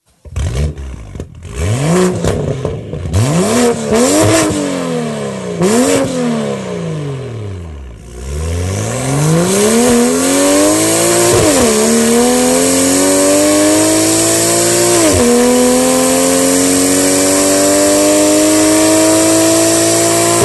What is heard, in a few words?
Downpipe w/o Cat BMW M2 F87